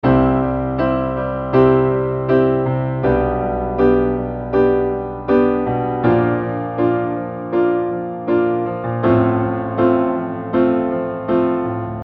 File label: Piano